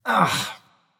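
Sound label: nonverbal ugh; smite; voicepack; merlin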